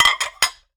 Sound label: Plates Stacking, Kitchen; 1001 Sound Effects